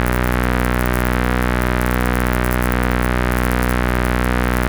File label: crackle